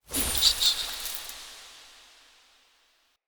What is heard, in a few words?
Squirrel Mote; Animal Motes